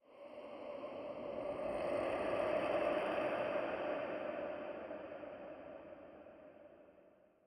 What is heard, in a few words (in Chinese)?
灵魂沙峡谷幽魂声; ambient